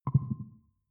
click beep bass tumble

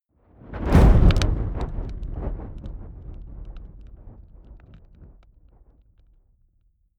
fire whoosh crackling hard; swooshes-swishes

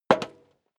step leather shoes metal rattle single left; schritte

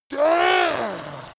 yeti grunt pain anger

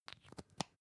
lipstick close soft; haus-garten; badezimmer

